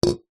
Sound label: household flask thermo metal set down wood; zapsplat